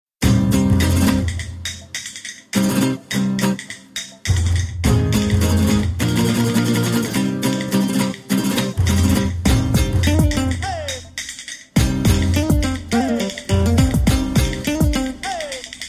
Bass

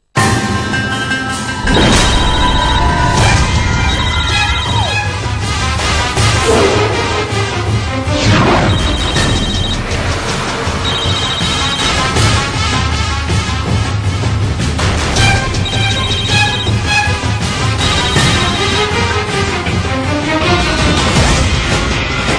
cell Phn Mix

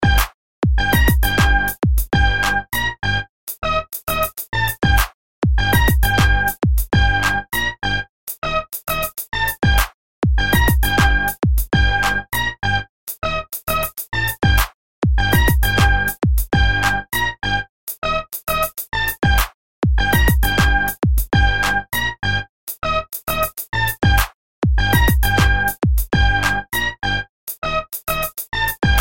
Synth

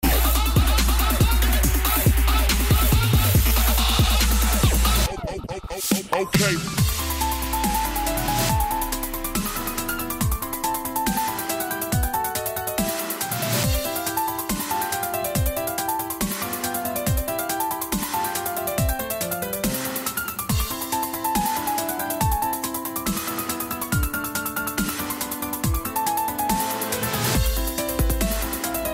mozart trap

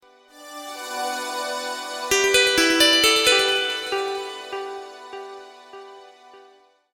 newage

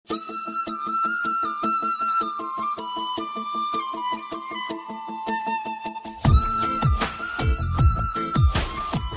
Best Tone For All

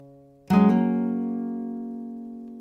Guitar Notification

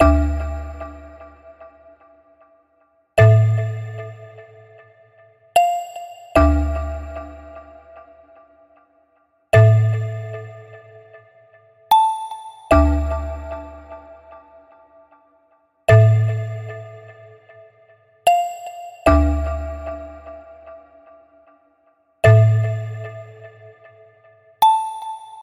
Heavy Atmosphere

